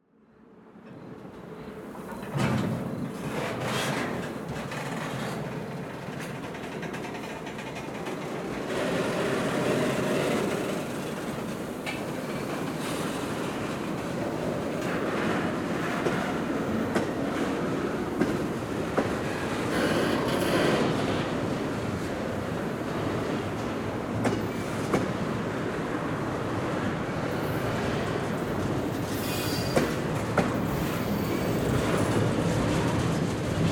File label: train, rusty old freight trains up close; Kevin Durr; San Francisco